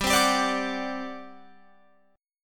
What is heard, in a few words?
G Major 7th Suspended 4th, G, down, Guitar, Open F; GM7sus4; strummed